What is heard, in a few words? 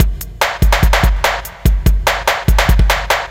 drums, Hardcore Breakbeat, 145, Ebmin; Big Fish Audio; Hardcore Breakbeat Action; Riddim